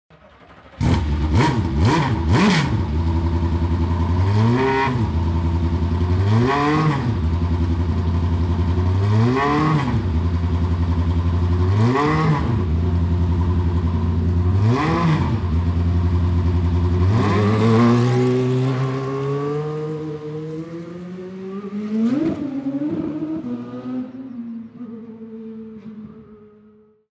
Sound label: Porsche RS Spyder